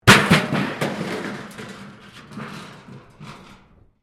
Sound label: metal rubbish bin smash concrete